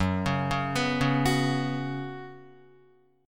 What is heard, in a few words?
F sharp Suspended 4th, F sharp, Guitar; arpeggiated; F#sus4